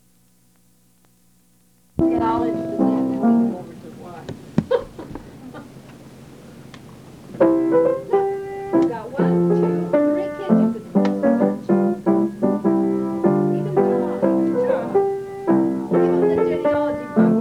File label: Piano Playing; H Tracy Hall; Misc Tapes of HTH